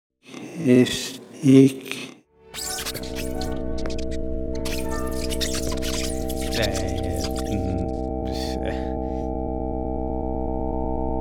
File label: Disturbed Waltz; TRAILER